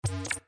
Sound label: dialog close